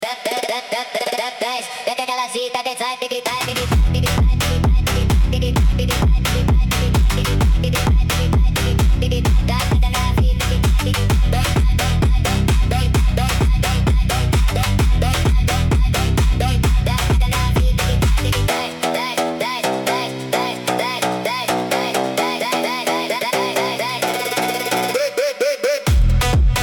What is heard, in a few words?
phonk